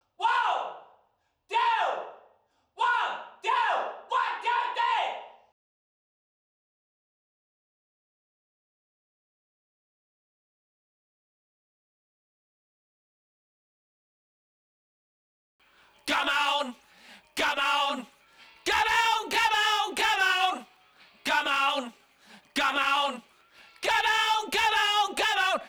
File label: BG Vocals